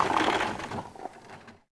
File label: Chariot End; Civ4 warlords